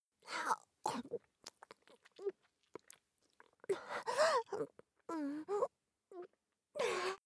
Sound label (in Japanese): キス かぷり